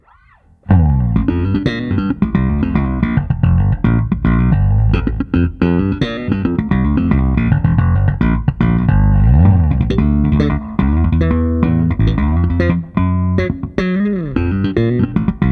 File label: jazz bass slap tone no drums; custom roscoe jazz jones